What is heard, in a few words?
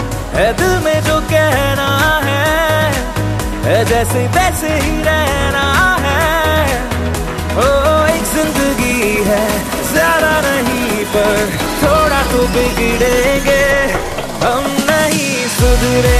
Bollywood Ringtone